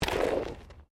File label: Body movement Slide Road; Body movement foley to simulate falls and deaths; Bodies (Sound Effects:Human)